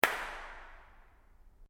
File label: Clap single ambient reverb, hand sounds; Applause Sound Effects